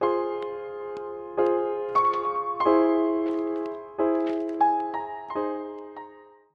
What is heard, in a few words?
sad piano; drawnTogether